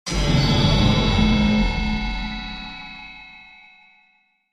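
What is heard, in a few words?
Stab High Frequency Metallic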